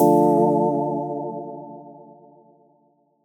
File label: Dm, Chords; Absolutely; M8; Electronisounds